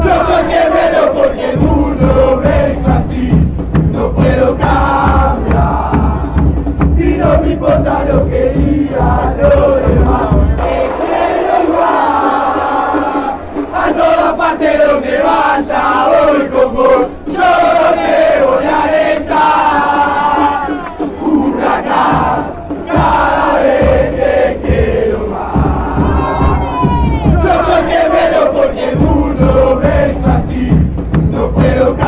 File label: chant, argentina; huracan